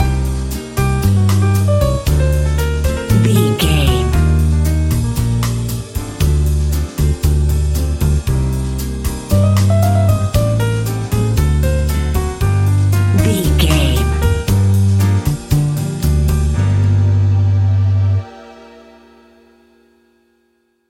latin espanic music, An exotic and colorful piece of Espanic and Latin music; Great for Zumba parties